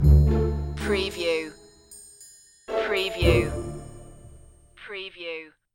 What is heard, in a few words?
pondering stinger